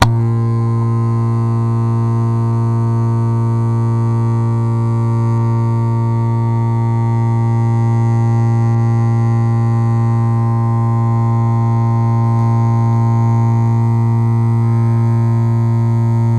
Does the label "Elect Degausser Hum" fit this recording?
Yes